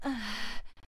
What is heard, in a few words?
Moan